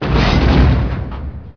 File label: crash rock